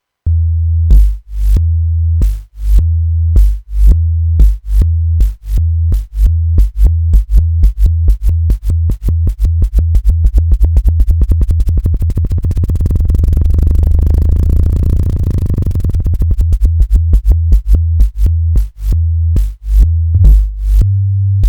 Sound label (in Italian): Suono generato con X LFO; Sonicware; Liven XFM